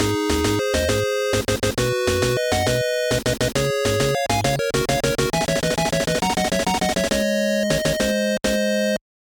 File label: World Clear 8 Bit